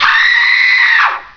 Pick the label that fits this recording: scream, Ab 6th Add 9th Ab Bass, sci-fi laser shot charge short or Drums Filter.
scream